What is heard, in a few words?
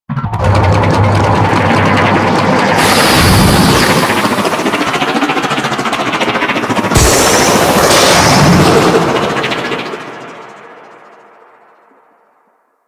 Passenger Shuttle Drop B; Warframe; Ambience; Space Battles